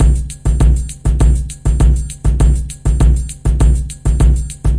200 bpm double kicks brazil, DRUM LOOPS and BREAKS